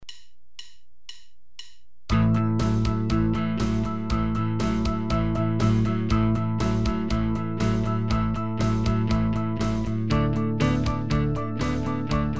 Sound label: 12 bar blues progression in A using double stops, guitar